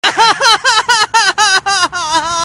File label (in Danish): grin